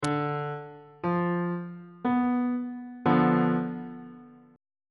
a double sharp diminished 1st inversion on bass clef